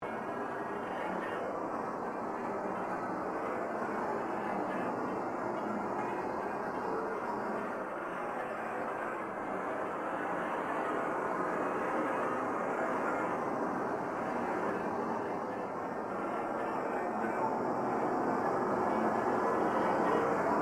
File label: Created Ambience Sunken Cathedral Loop, Artificial Sound Effects; Various Ambience created through sound manipulation in FMOD